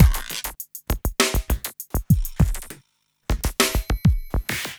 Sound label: Smooth, R&B Drums